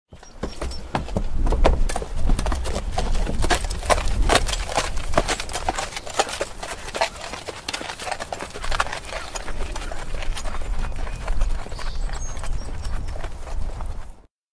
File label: Sound Effect Horse walking